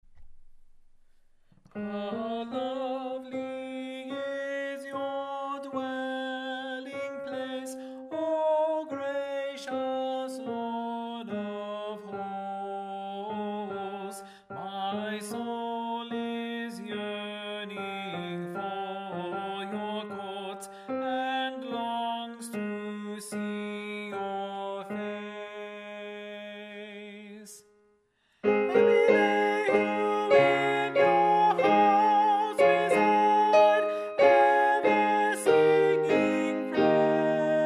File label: Alto; How Lovely is Your Dwelling Place, R. Proulx; Psalm 84